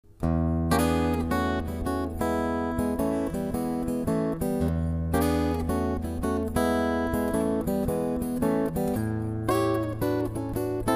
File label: smooth blues